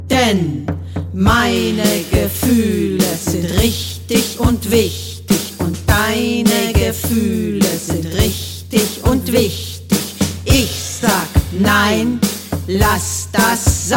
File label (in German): rap; Kinderschutz